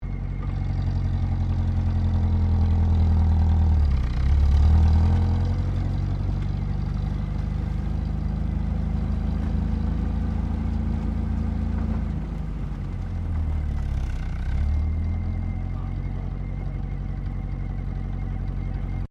CAR NISSAN BLUEBIRD DRIVING CROSS COUNTRY LOOP, beat up old datsun; 1983; Cars (Sound Effects:Vehicles)